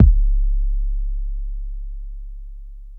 SOUL 808 KIK

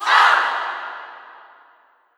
AAHH

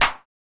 Snares

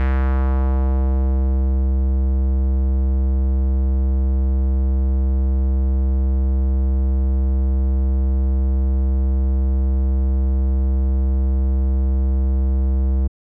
808, Bass

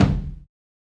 tom roots

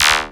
Perc (Zap); Percs

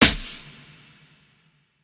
Snares

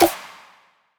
ruined edm snare; Snares; Cymatics

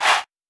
Chant

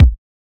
kicks

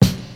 Kick

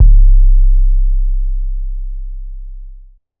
Not Nice 808's

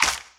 clap

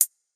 Hi Hat

